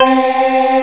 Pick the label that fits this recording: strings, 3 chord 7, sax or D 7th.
strings